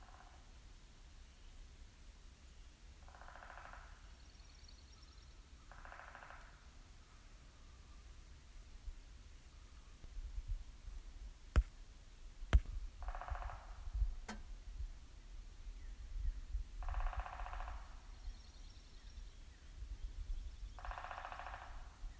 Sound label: pileated woodpecker, bird; txbird